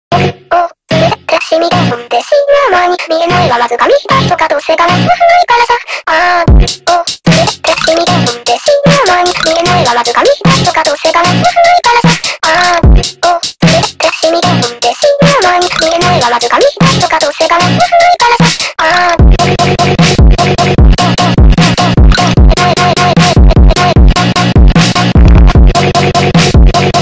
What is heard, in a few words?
phonk remix